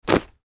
School bag backpack drop on the floor; luggage

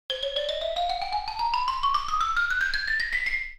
Cartoon xylophone ascend

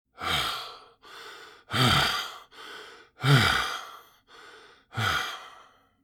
Exhausted old man breathing

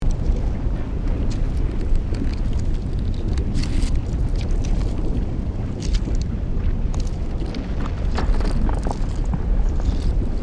Volcanic debris rocks rolling ambience loop; nature

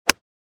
Golf 5 iron swinging with impact

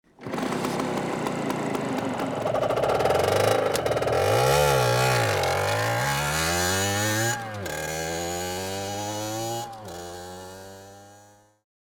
Scooter drive away at fast speed

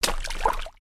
Pig moves in wet mud inside shed

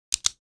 Pen office single click

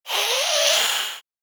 Vampire hiss monster aggressive

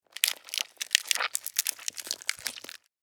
Fantasy creature eating flesh

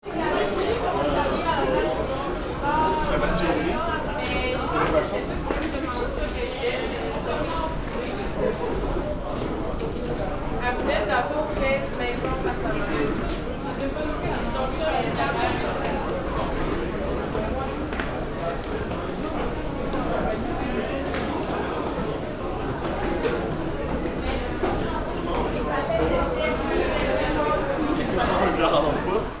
Busy city rumble with shopping center; Urban, Town, and City

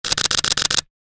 Paparazzi photo blitz

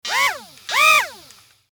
Small RC toy servo motor whirring

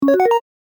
Infographic call answer notification

